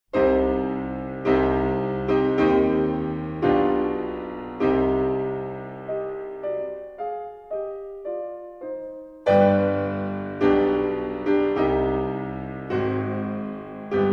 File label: Trauermarsch C Moll; Mozart